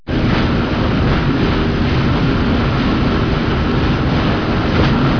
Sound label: nautical